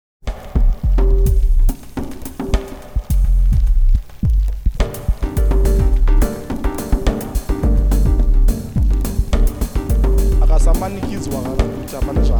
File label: peace fog; dense fog machine